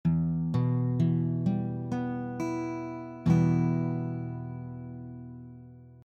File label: E minor, guitar, you will hear the chord being played one string at a time and then all the notes together; master the basic chords on guitar a beginners guide